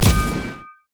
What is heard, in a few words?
light strike; LoL; lux